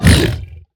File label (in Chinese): 疣猪兽受伤声